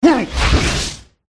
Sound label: mage attack voice middle